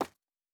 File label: Footstep Concrete Running; Character Sounds